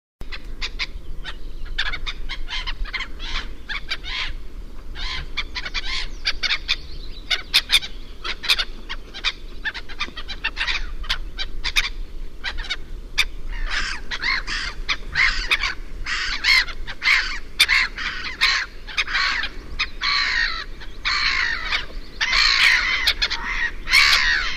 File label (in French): mouette rieuse